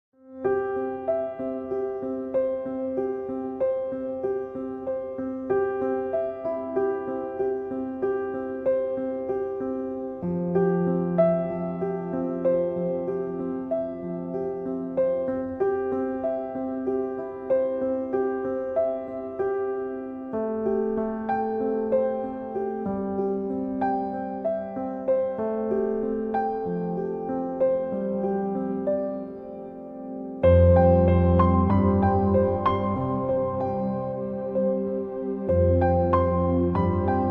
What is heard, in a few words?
Soothing and Relaxing Piano Music